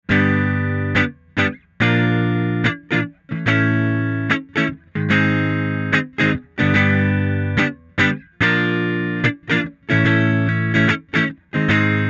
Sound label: room coil